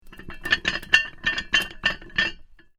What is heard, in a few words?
Heavy Chain Rattling On Winch Short; Chain sounds; Metal Sound Effects